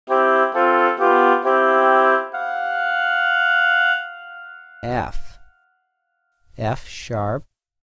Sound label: F4 F#4 Oboe 2 Note Stereo; Level Three “Stereo” Example; ear training two note